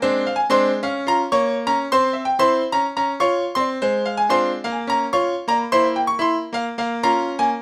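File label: 126 Latin Jazz Piano F minor; Grand Piano; Digidesign; Pro Tools Essential Loops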